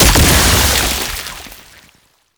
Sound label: Infested Spore Pistol Explosion; Weapons